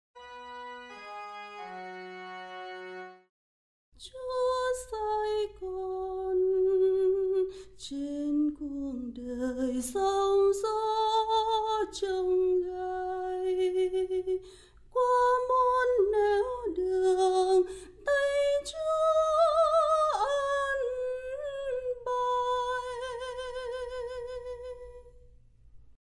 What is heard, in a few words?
organ